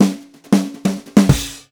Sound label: Country Drums Ending; Digidesign; Pro Tools Essential Loops